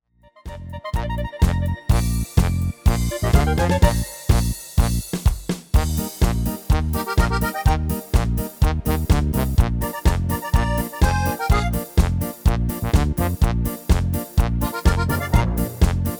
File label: MIDI